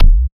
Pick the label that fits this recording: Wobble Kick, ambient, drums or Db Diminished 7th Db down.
Wobble Kick